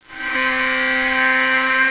alarm

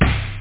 BENG; da capo vol1; drums snare

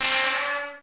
error; MS PLUS!

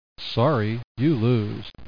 lose

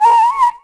wolf hit; DAoC

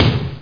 kick C11; CD Action

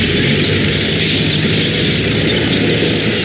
Engine; PanzerE

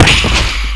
Medium Impact; Carma

